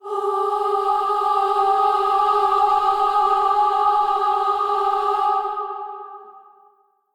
Kuva Lich Requiem Mod Choir C

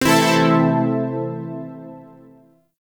Shawzin Pent Maj Chord I; Warframe; Zariman Shawzin; Chords

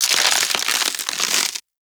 Boss Platform Crack; Warframe; Cephalon Weave

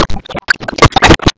Pulse Cast; Warframe; Gyre

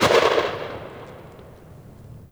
Uzi Tail; Warframe; Tenno

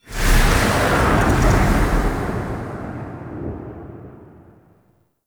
Diorama Whoosh Out; Warframe; Tau; Tennocon Relay

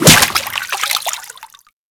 Whip Impact Water, Weapons

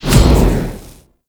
Void Dragon Orb Catch; Enemies; Abilities; Warframe; Duviri

